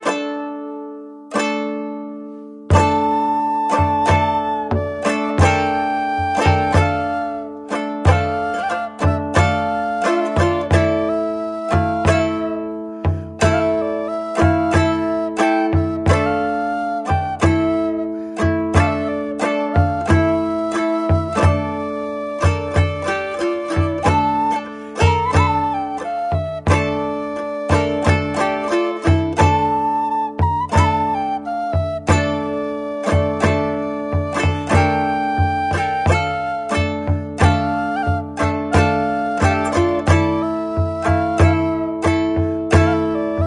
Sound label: bard kitara drum flute